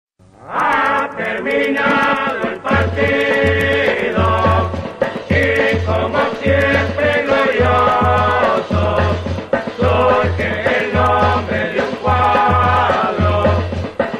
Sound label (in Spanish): Himno Club; Club Sportivo Arigas